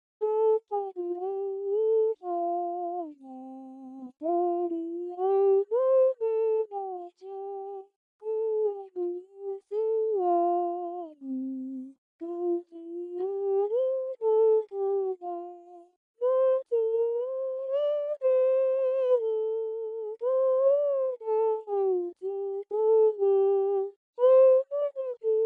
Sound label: Guitar; MEIKO-Guitar2